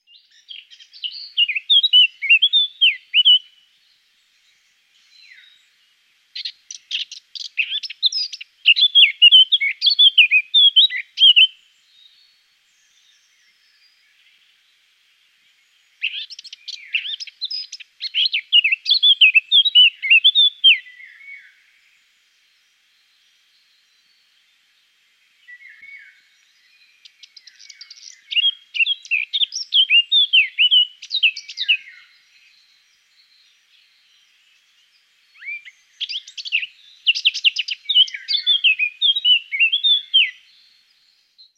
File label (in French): fauvette a tete noire chant